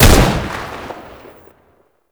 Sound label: AK47 Fire; Warframe; Tenno